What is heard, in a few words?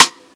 clean snare c key